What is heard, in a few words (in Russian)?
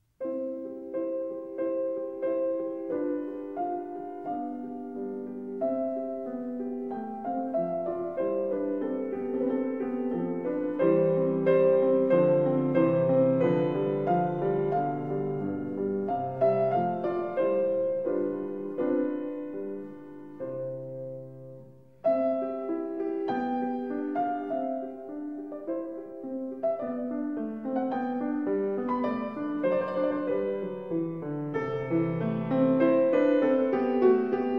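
sonata dlja fortepiano g dur andante, sonata for piano G major; bolezn v gaage; KV 283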